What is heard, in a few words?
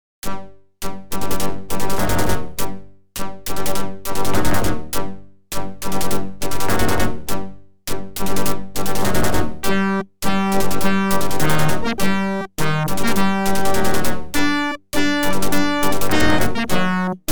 OPL2; Death Star Destroys Planet; X WING